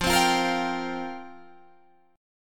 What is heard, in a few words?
F Suspended 2nd Suspended 4th F down Guitar Open G